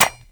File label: Bird Three Single Step; Ambience; Warframe; Entrati